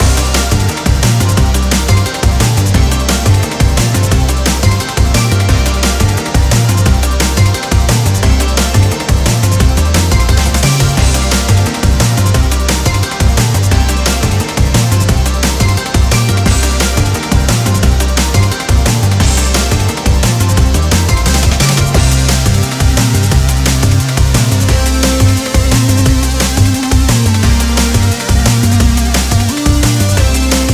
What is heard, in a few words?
BGM MIDDLE BOSS